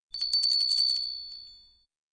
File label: Beeps; 헐리웃특수효과음; 신호-경적